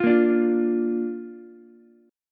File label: A sharp Major, Ukulele; strummed; JGuitar